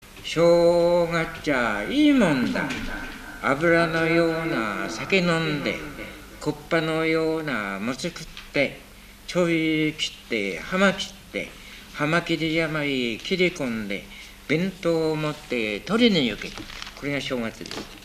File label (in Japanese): warabeuta